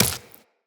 Run Flesh, Footsteps; Tenno

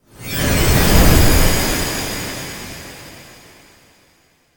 Stinger Whoosh Out; Sentient Orchard; Warframe; Tau; BoroOverlook

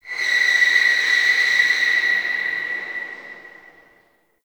Distant Car Squeal; Ambience; Warframe; Nineteen Ninety Nine

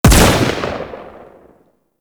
Heavy Pistol Shot C; Warframe; Weapons; Zariman Heavy Pistol

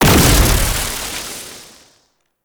Alchemy Grenade Explosion Electricity; Entrati

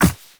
Walk Ice, footsteps; Warframe; Characters; Operator

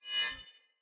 Spear Whoosh Accent; Weapons; Cleansed Spear

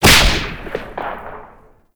Whip Crack; melee; weapons; Oraxia

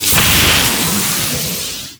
Lightning Rod Strike; Ambience; Gameplay; Warframe; Corpus Gas City; Gameplay Remaster

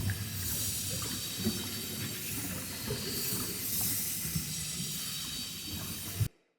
Fey Ward Healing Totem Heal Loop

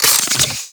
Sidearm Reload; Warframe; Weapons; Tenno; Wraith

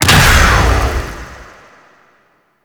Scarab Swarm Kavat Burst; Warframe; Mummy

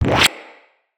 Magnetize Deflect; Warframes

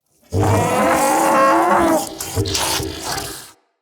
Pain, Barks, Infested; Enemies; Ancient Shell

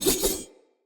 Dagger Spin Whoosh; Weapons; Enemy; Sinecure Dagger

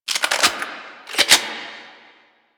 Weapon Test Reload; Warframe; Caliber Chicks Minigame

